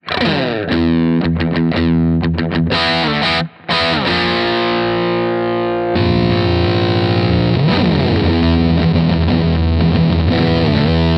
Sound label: Bass Guitar Blue Box